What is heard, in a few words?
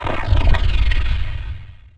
Teleporter Abduction Teleport Back; Evolve; Wraith